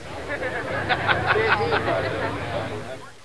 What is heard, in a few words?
house good; Caesar III